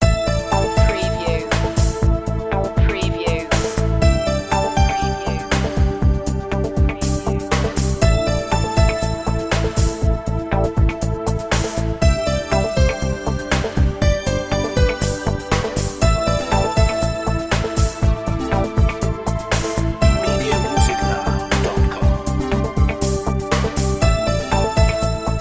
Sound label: corporate background